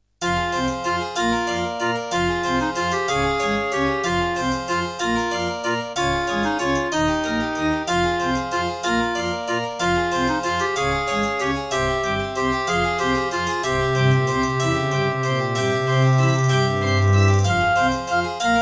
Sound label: Serdaco Yucatan FX (Turtle Beach Cancun FX Clone); Death Gate